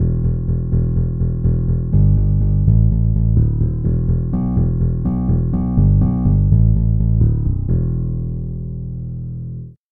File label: fingered bass bass